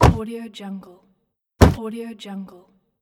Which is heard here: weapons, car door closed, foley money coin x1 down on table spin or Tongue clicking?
car door closed